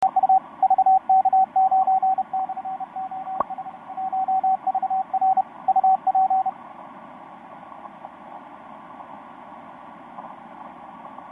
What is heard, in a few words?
10m cw; vk9-oh1vr; ba7io